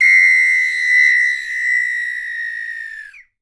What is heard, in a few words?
SAMBA W, SAMBA WHISTL